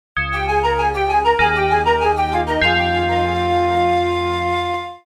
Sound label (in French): Melodie Jingle annonce